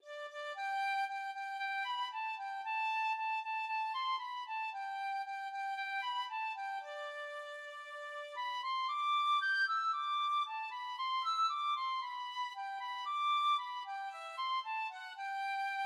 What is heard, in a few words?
tin whistle